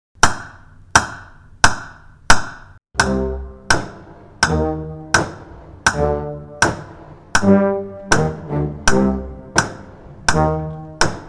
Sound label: Creatures in the Attic (Practice Slow Clicks)